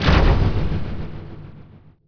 plasm can; PC Gamer IT CD 41

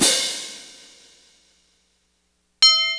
cymbal, Kids; KILLERSOUND; Remixable SoundSets

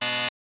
Warning; Net Power 2000 #2

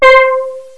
steel drum; Echo 1.1

